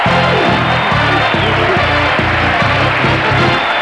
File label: Guest fanfare